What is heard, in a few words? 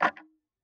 Tick, Clocks Kitchen Clock